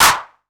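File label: 808 clap; PERC TR; Wizoo - Platinum 24 Electronic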